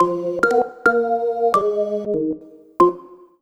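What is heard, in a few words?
Lead Jazz organ, Drum & Bass Keys; Sonic Foundry (Sony Creative Software); Knutrix Drum & Bass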